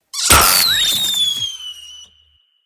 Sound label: New Years Rocket